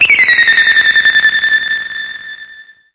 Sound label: warning